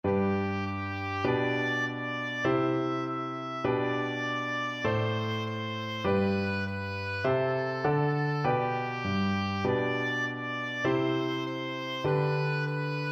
twinkle twinkle, oboe

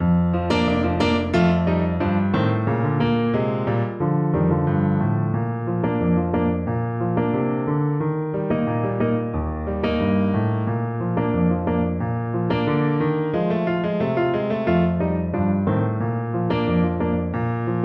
Clarinet; Bury Me Beneath the Willow; F major

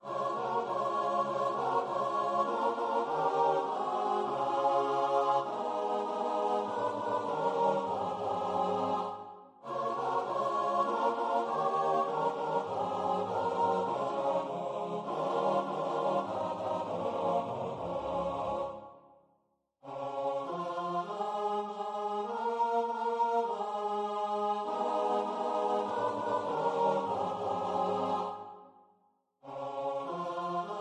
CHOIR

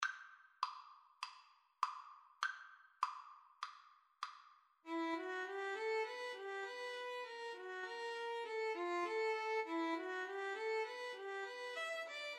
mountain king, Violin Cello Duet; greig

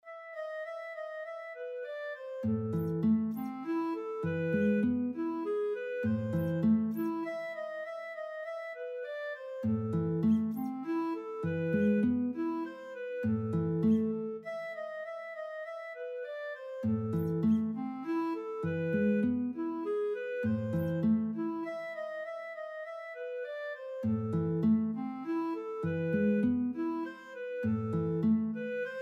guitar clarinet duet; beethoven fur elise

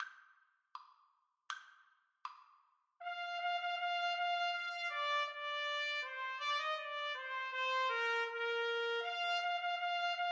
duos marcia, trumpet duet

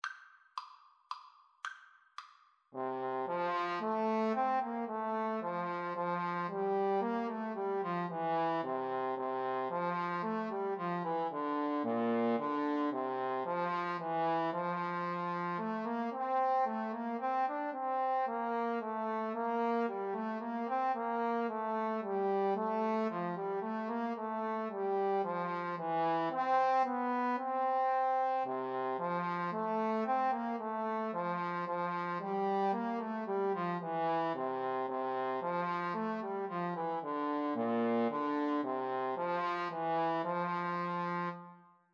Trombone Duet

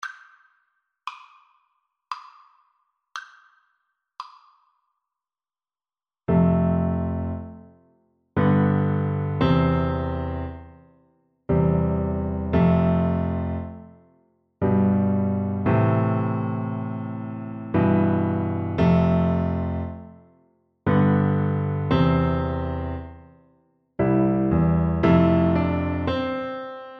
holst jupiter, bassoon

